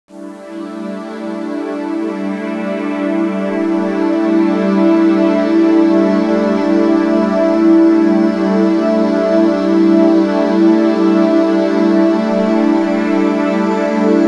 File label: Mono 8-bit